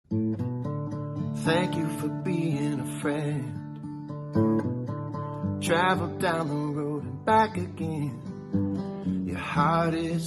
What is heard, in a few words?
male version